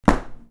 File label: baton hit; infantry